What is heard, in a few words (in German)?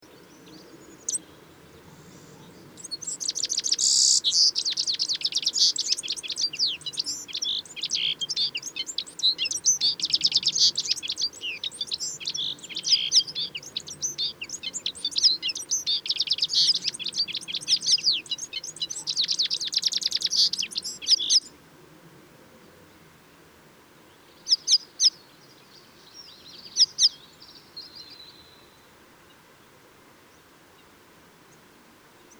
Zitronenzeisig; Wank GAR; Michle Pron